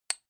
click glass generic, signale

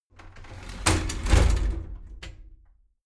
shower slide door inside close heavy

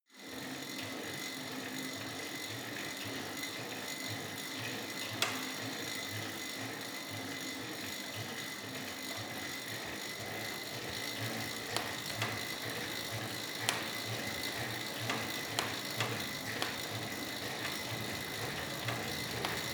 indoor bike sequence medium; sport freizeit

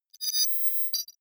confirm swell click; soundpool

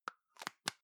plastic can lid screw close soft; objekte; dose

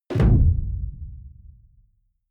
sci-fi underwater impact; hits impacts

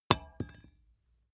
small metal drop clang soft; industrie wirtschaft; metall

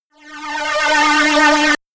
shot uprising shine; pulsieren

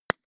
gun shot silencer medium; schusswaffen

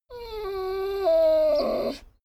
dogo argentino whine complain; natur-tiere; hunde

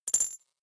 noise tiny splutter, signale; soundpool

